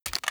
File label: g3 reload lift